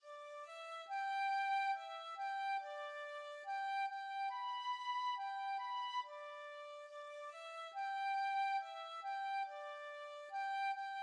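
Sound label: penny whistle, tin whistle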